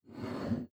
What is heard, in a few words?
Mug Slide